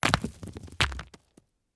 golem die; propack

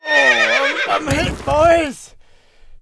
horseman die; propack

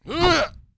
guard attack; propack

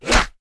horseman attack; propack

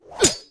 swordman attack; propack

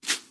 defense tower attack; propack

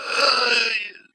archmage die; propack

